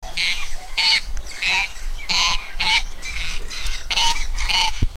group of baby goats walki, farm

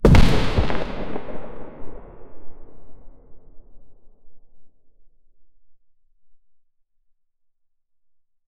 red wooden barrel explosi, powder

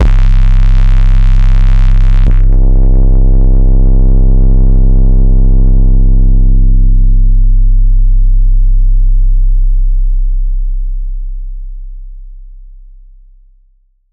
distortion 808 bass like; production

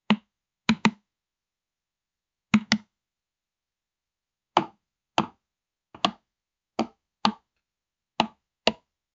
plastic container taps

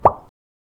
bubble popping in a, bubbles

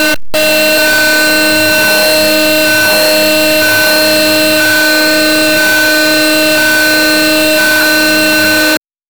school alarm, school bell

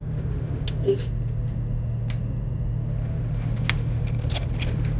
dark interior of an old; mysterious

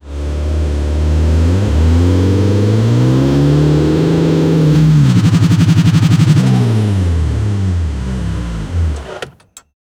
internal motorcycle engine

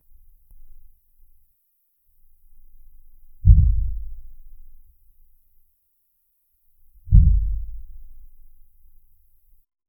realistic astron, space suit

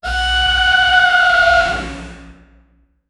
a very scary and sudden, scary

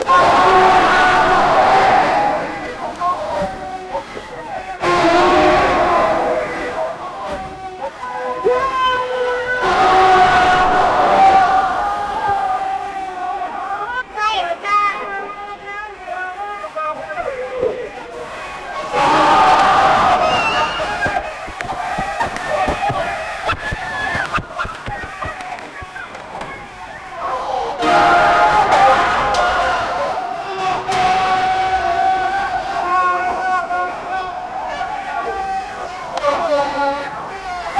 cinematic ambient feudal, japan

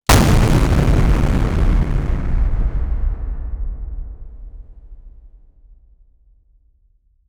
a giant monster hitting, aftermath

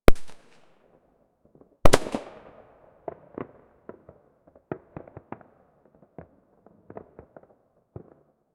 a firework but a single

